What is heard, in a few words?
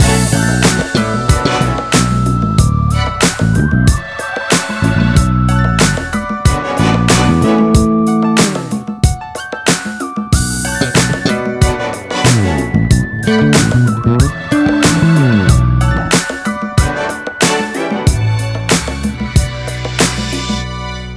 8B BEAT; iwapt